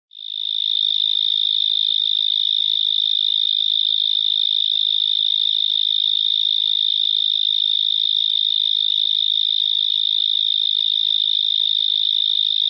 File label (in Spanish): grillo fuerte